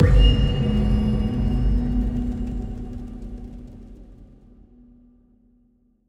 win medium win; rise of maya

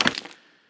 button Paytable; secret of the stones

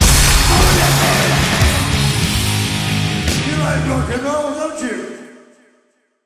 large Win Musical

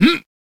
santa Pulls; jingle spin

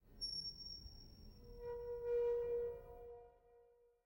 ambience sound; goldengrimoire

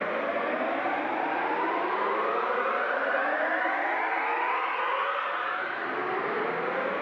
Respin Snd; starburstxxxtremeusa

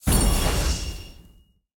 feature Start; rage of the seas

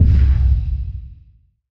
spin button release; archangels

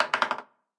diamond, roulette; advanced-roulette

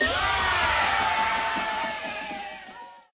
near win Win; trollpot

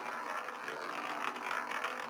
ball Loop Outer, roulette; advanced-roulette

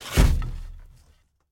quick Stop Bounce; lost relics

